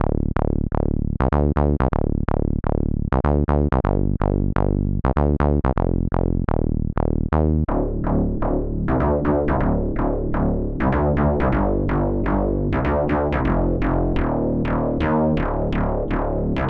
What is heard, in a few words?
Saw Bass; Dispersion